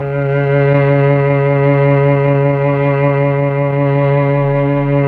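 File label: strings C#4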